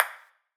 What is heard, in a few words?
ping pong